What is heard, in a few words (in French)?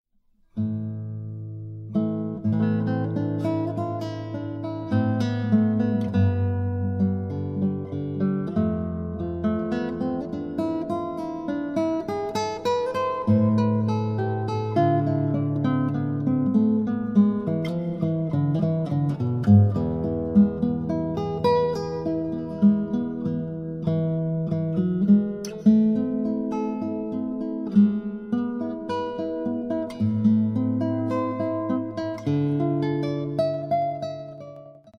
Marcher avec l amour Guitare